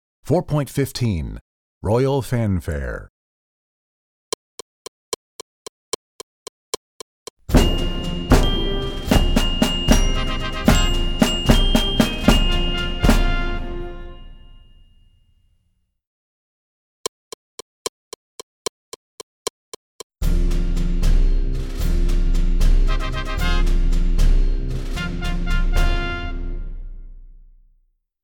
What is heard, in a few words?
Fanfare, Percussion; Percussion Book 2